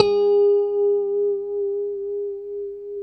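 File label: A4 TAP harmonic, guitar; Spectrasonics; Hans Zimmer Guitars VOL-1; JC-TAPONICS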